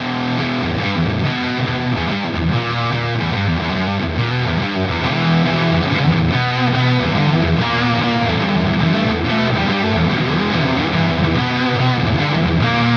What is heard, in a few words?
Fender both Fuzz; Fender vs Harley Benton